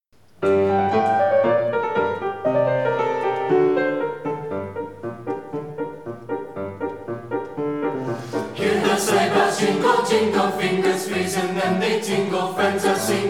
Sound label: sleigh bells a russian folk tune